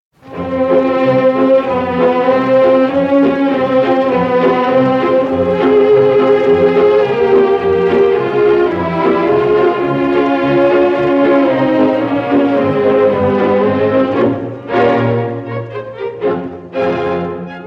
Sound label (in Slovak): Nitransky Tanz, Slovaquie